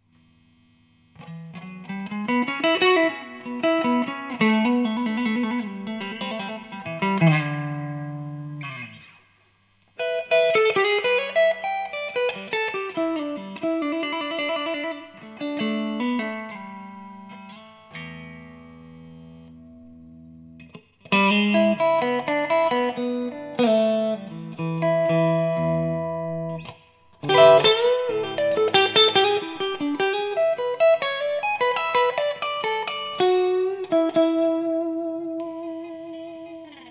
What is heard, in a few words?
clean